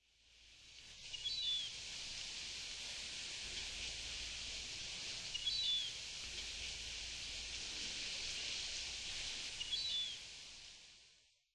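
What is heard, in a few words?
vega gull, sado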